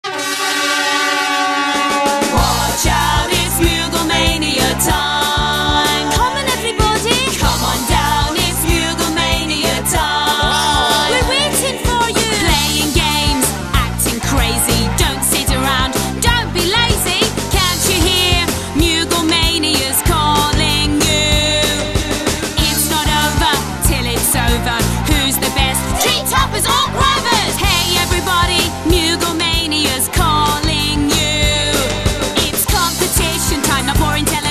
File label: Kids Gameshow Intro; Mugal Mania